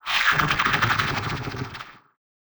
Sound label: Deepcoiler What